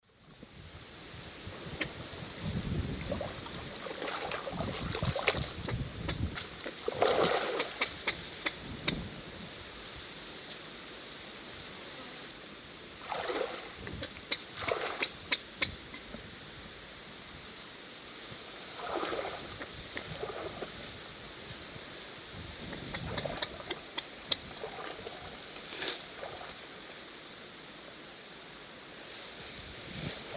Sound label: Nomonyx dominicus, call; S. America; Eastern Lowlands